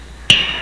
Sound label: key